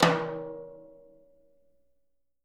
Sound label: Living Drums, JZ XTOM 1